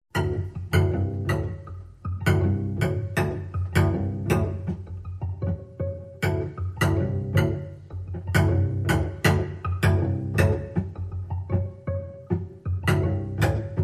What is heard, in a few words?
Cello Pizzicato & Harmonics